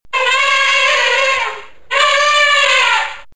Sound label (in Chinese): 大象